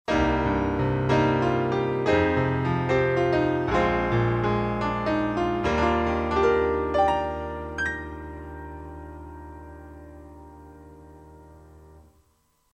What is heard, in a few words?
UA 476p boesendorf 290 close comp fast